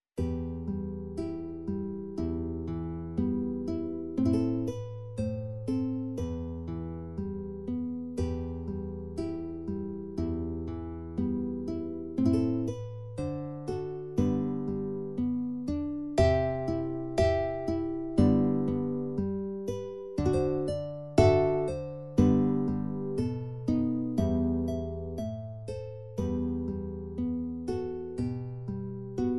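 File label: guitar; ne v slovah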